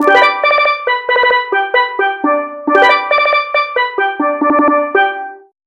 Steel Drums; First Call

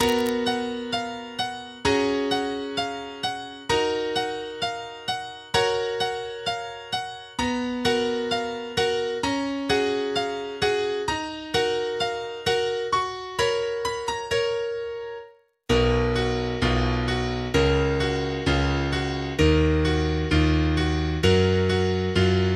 8 bit

